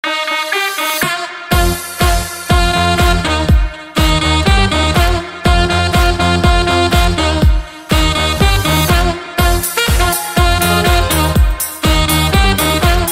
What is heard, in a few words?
sax remix